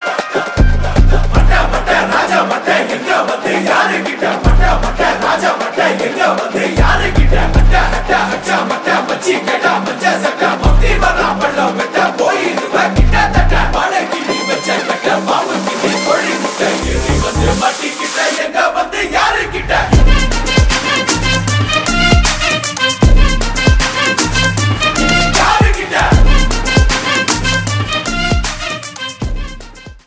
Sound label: song ringtone